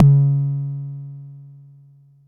Bassdrum, Jomox Xbase09

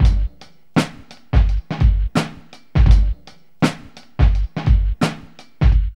SWINGIN 84, Funky Elements LOOPS; Zero-G

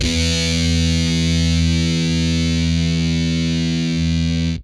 POW CD FUZZ, Guitar; Quantum Leap

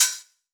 HH, Drum Mashines; EdgeSounds

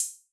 Pedal HH, Yamaha RY20

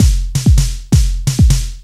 club to death, Deep House 130bpm

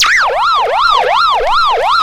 alarm, FX & ATMO, ALARMS & ALERTS; Techno Trance Essentials

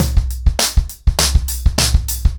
100BPM, ACOUSTIC DRUMS; Sonokinetic - The Roots Drumloops

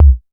Kick Middle, Modular

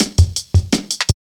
Foot Tapz Cut, Drum Loops 165.5; Zero-G Jungle Warfare 1, 2 & 3

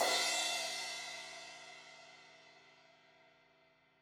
Crash 16in edge, Cymbals; Deeply Sampled Drums

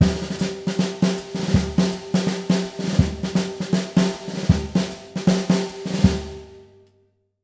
BRKBT, Jazzistic

